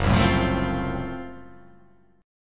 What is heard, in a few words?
F sharp Minor Major 7th Flat 5th F sharp down